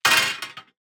DICE on Metal, Throw and Roll, Standard, 5 Five Dice; Samples; ArticulatedSounds; DICE Sfx Party (Extended)